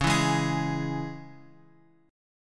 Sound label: C sharp Minor; C#m Chord {X 4 2 1 X 4} strummed